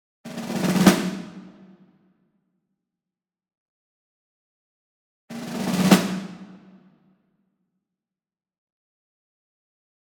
SNARE Ludwig Black Beauty Roll Medium; SINGLE; boom library; Cinematic Strikes Construction Kit

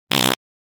Fart Foley Short And Healthful; Hollywood Edge; sound designer's toolkit v2

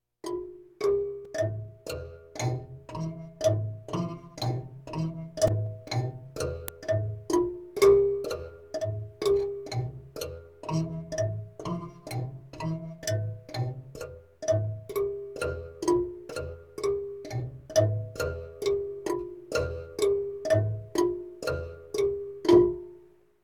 Percussion Bongos Tympani rolls Gongs Drums

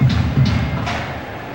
Baseball Fanfare Loop; Crowds

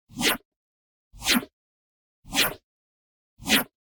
Generic Slide; boom library; The Interface

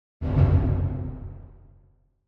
Drum Deep Symphonic - Orchestra Drum Double Hit; Hollywood Edge; sound designer's toolkit v2

